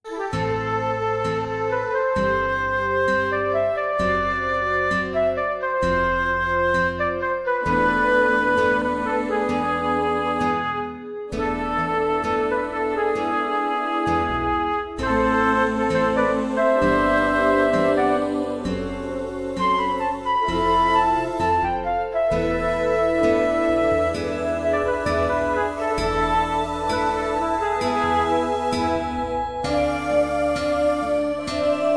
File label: Flute Resynth